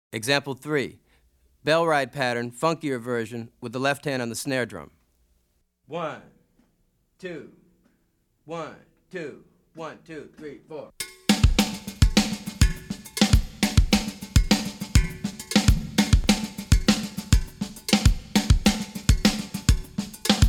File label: Afro-Cuban Grooves For Bass And Drums; Drummer version; Goines, Ameen